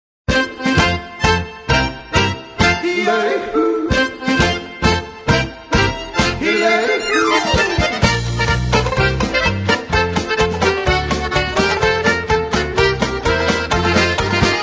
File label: polka